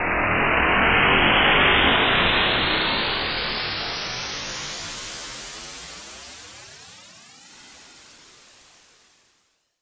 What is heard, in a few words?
Space Flare Launch